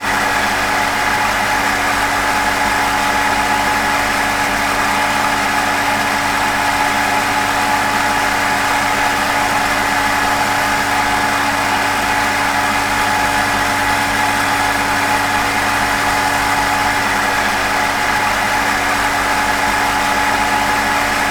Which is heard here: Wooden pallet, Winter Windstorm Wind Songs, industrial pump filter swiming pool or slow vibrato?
industrial pump filter swiming pool